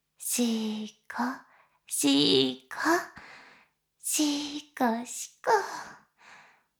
しこしこ音声; 牧場戦腺; 少尉

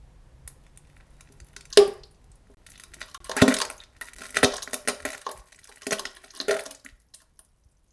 やわやわなんべん：むちち（ぼんっ） ぶぼっ （ぼちゃ）ぼぼっぼっぼぼ ぼ（ぼちゃぼちゃちゃちゃ）

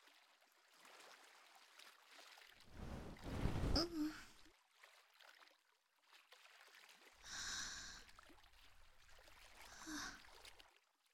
右耳かき

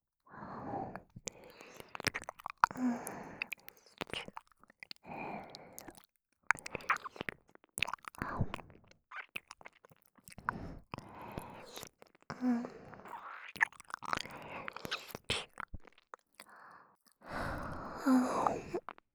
耳舐め 左

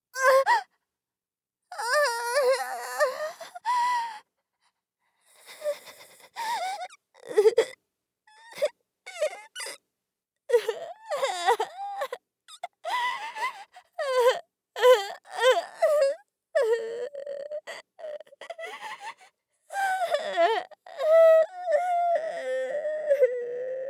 出されて泣く; 効果音なし

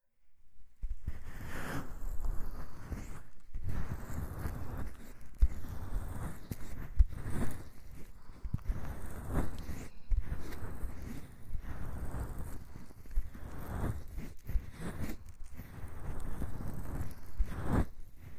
両耳指かき＆両耳吐息

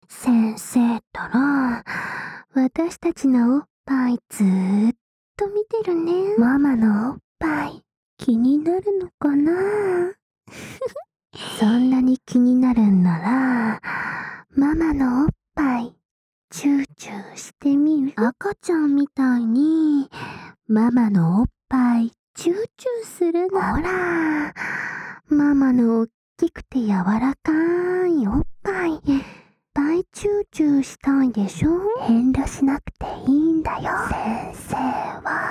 ダブル 授乳 囁き 耳舐め (SEなし); エロかわJKと子作りえっち♪

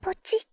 マウスクリック音; ベル・カールステッド

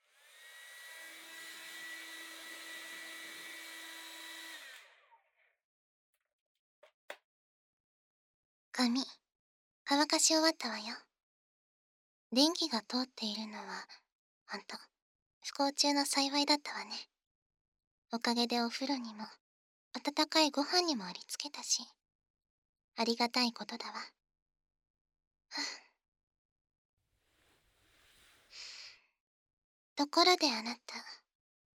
耳舐め手コキ, ASMR

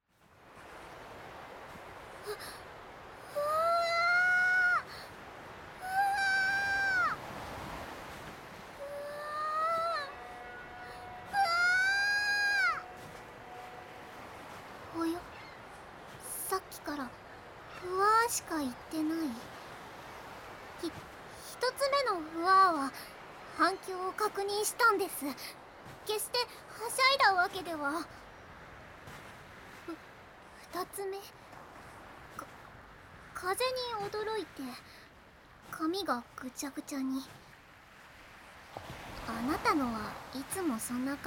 波の音を聞きながら浜辺をお散歩です, ASMR

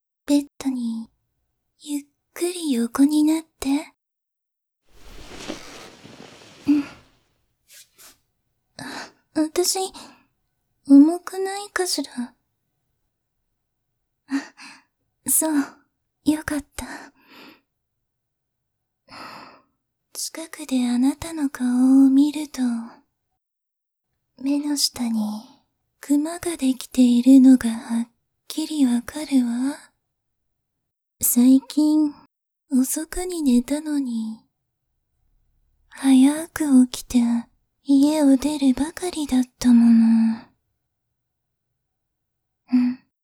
ベッドで優しいキスを

